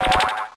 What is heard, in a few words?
Celebrate